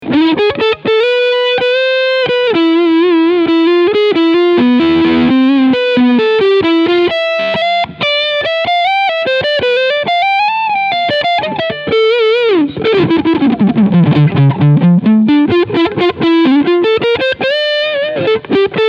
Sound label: Overdrive Front PU